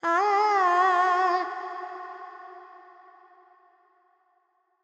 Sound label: Pentatonic, Vocals, Carnatic Female, Alaps, G; 70 G; Sample Banks